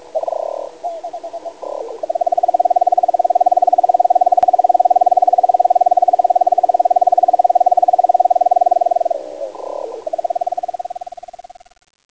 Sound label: hawk